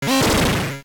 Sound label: Boss Scream; LOZ FDS